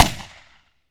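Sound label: percussion shotgun, guns tuned; Roland - Rhythm Section; Guns & Glass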